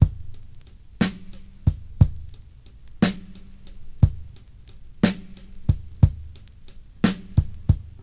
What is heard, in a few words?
60 bpm breakbeat c key, Free drum beat - kick tuned to the C note. Loudest frequency: 834Hz